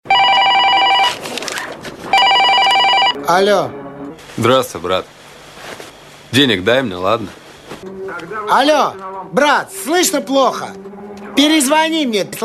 Брат звонит

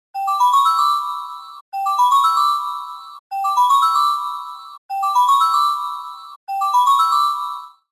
Звоночек

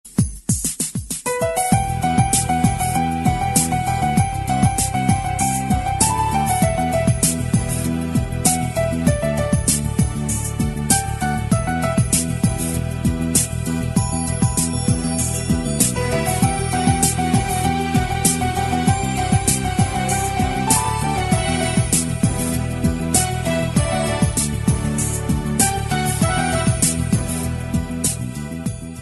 Skripka

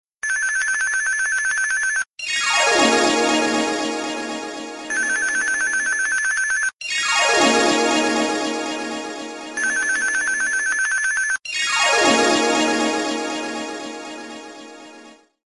Звоночек

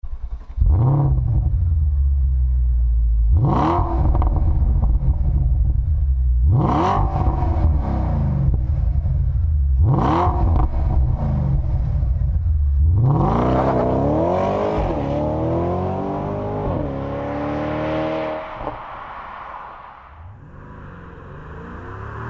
Звук двигателя Mercedes AMG V12

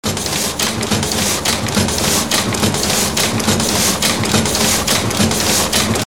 Звук ксерокса

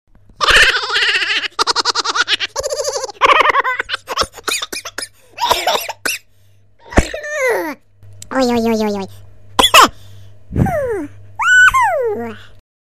Смех

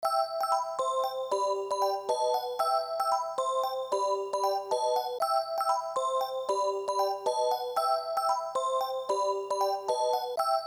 Звоночек

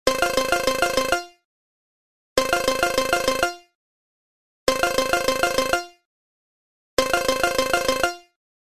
Звоночек

